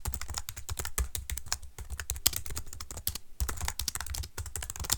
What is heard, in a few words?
typing